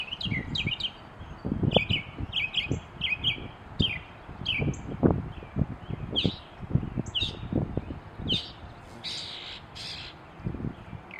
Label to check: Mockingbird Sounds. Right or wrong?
Right